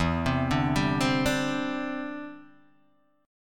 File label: E Minor 7th Sharp 5th, down, Guitar, Open D